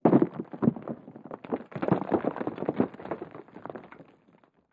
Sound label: Avalanche, Single Rock Falls